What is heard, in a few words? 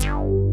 JS BASS; Pro-Rec - Synthsonic; TRINITY